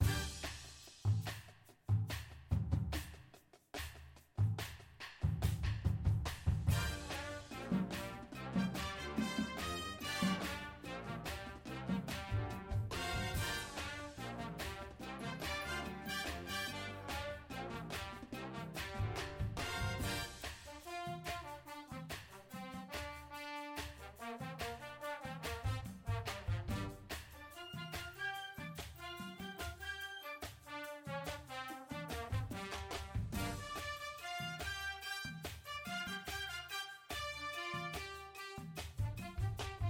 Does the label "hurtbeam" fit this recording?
No